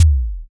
kick

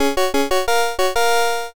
charge trumpet

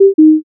error

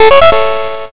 win

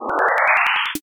hikido

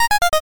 stairs down

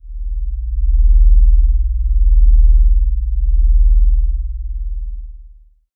space drone

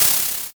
scroll open

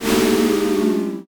sigh crowd

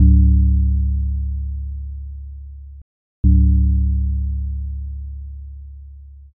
belltoll

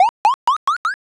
bounce

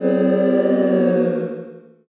commotion

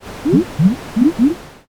poison

